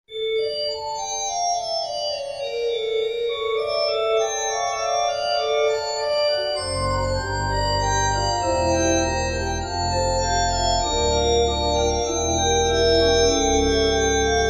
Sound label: Church; wersi